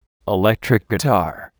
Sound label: electric guitar